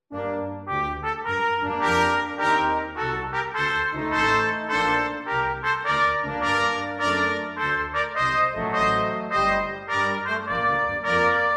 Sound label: prelude swing, midi